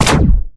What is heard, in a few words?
plasma small; ufoai; weapons